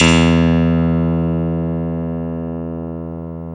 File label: KEY MKS P3, P3 + Rhodes